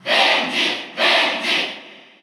Cheer Russian; Wendy; SSBU

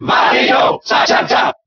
Cheer German; Wario; SSBB

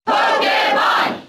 Cheer English; Pokémon Trainer; SSBB

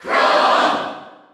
Cheer Dutch; Chrom; SSBU

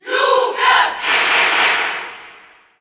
Cheer Italian; Lucas; SSB4; SSBU

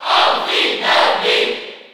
Cheer French; Greninja; SSB4

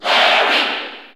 Cheer English; SSB4 SSBU

